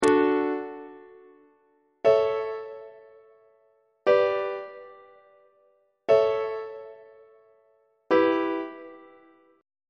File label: d major deceptive cadence; on treble clef